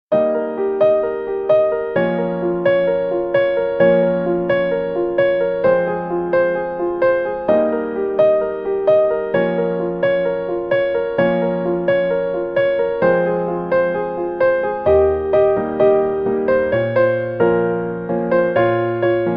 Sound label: instrumental